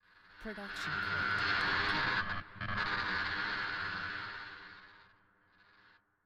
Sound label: horror scratching terror; soundscrate